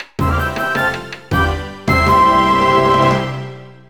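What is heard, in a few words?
Jng Taiko, Jingle Taiko; nodame cantabile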